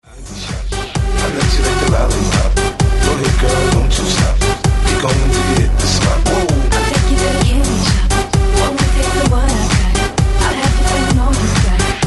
funk rnb hits remixed; multitrax

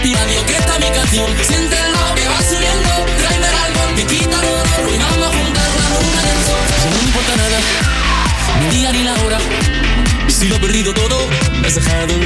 latin explosion; multitrax

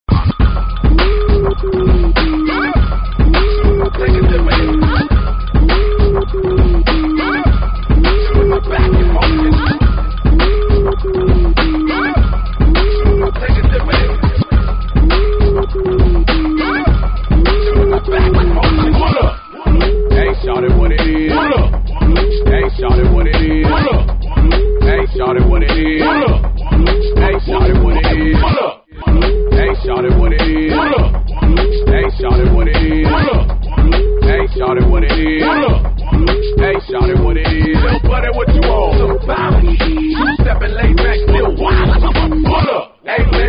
funk; multitrax

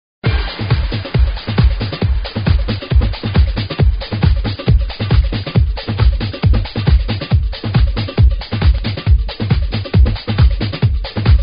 kick; multitrax

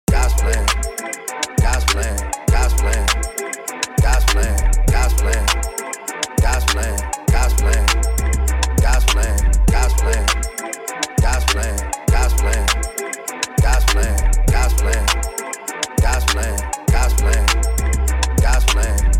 funk; multitrax

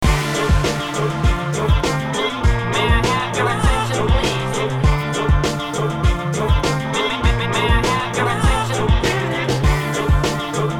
funk; multitrax

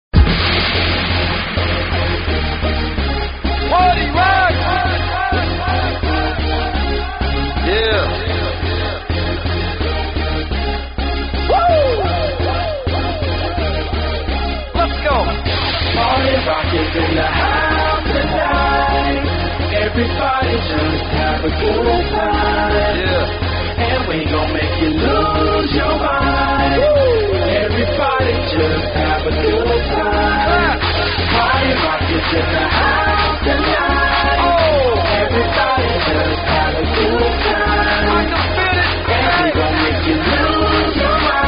funk hiphop

